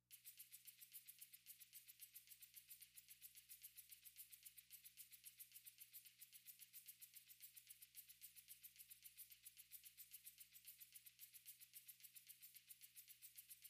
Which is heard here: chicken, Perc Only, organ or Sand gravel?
Perc Only